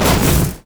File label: renekton execute; renekton volcano god